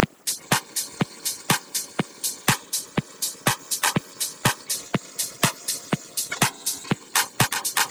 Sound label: percussive